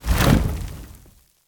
intro coffin disappear; Smite; Baron Samedi

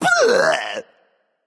grunt attack, voicepack; smite; jorm

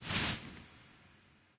cloth; smite

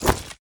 armor spin; smite; vulcan

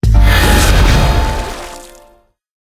explode; Smite; god Khepri

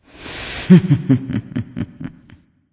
"heh heh"; smite; nike

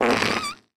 idle fart; smite; kuzenbo

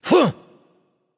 loud sound; smite; voicepack; osiris

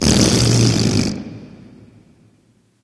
nv tongue; Smite; awilix

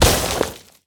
hit ice; smite; god merlin

